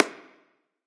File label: clap; Smite; Zhong Kui